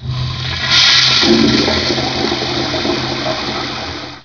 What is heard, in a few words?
TOILET; Nare Gewoontes